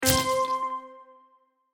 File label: pick up; spider drop spelling game